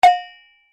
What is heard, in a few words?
COWBELL LOW, simple twin cowbell; Bell Sound Effects; Musical